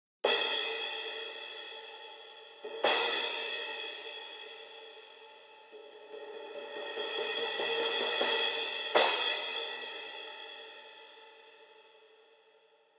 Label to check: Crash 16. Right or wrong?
Right